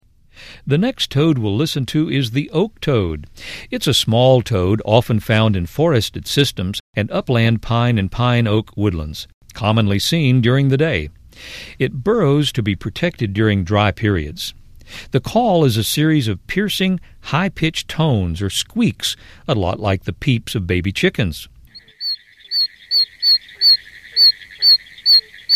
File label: oak toad